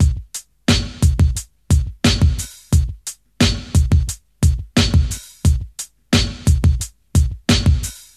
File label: 118 bpm breakbeat d sharp key